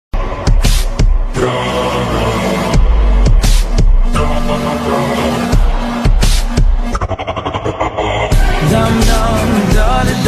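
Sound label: slowed reverb song ringtone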